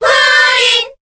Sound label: Cheer Korean; Jigglypuff; SSBB